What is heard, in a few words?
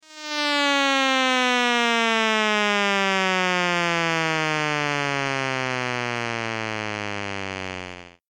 Retro video game game over sound; Descends; Sound Design